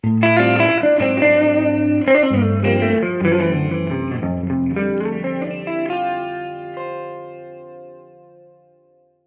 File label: Hagstrom Jazz